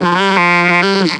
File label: Vocal Sample Pack Phrases Gm; Slate Digital Omnivox Vocal Sample Pack